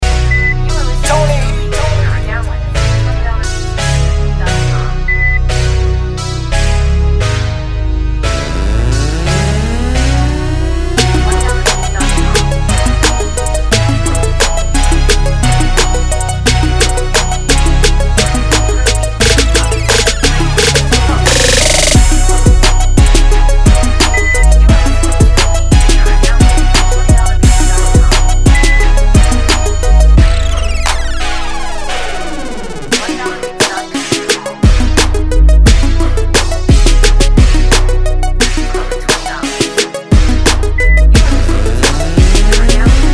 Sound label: Dirty South, beats